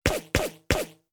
Silencer Shot

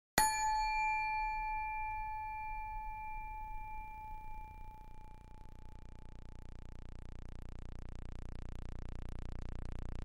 brain waves; مغز انسان; امواج صوتی برای مغز انسان

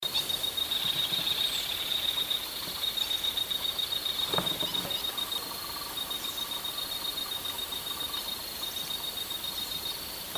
Least grebe; پرنده کشیم کمینه

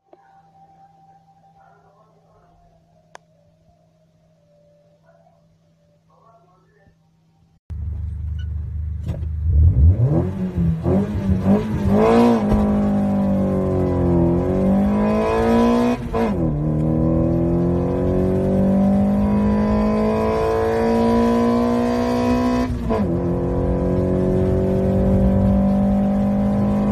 L90 exhaust

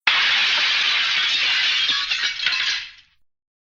Flower pot, شکستن

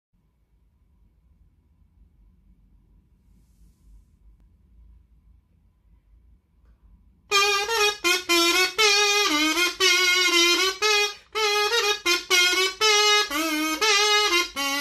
kazoo

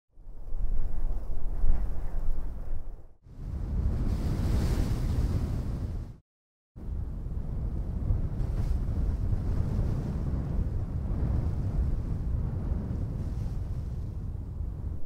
Arabian Desert sound

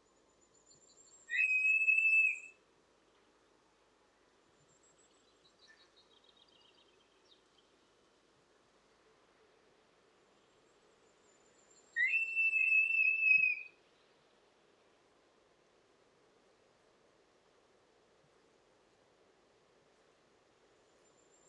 Bearded Vulture

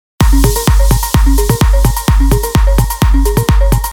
Techno ringtone

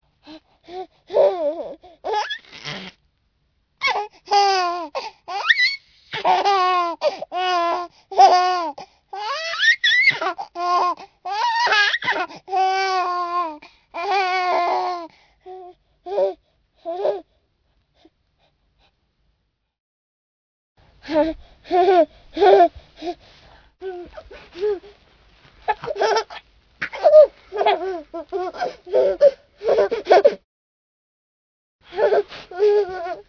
Laughing Baby

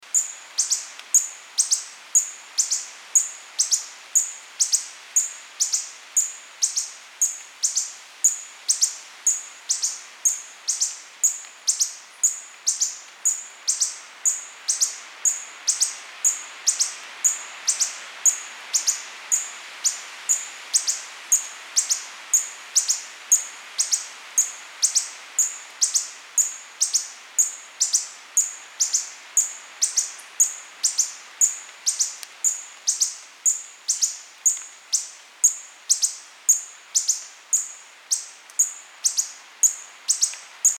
Coppery headed emerald

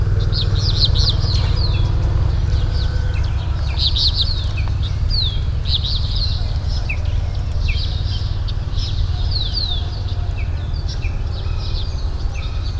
Cuban martin

